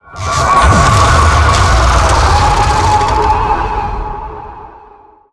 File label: Scream; Warframe; Tau; Sentient Vessel